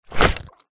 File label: cow pat poop land ground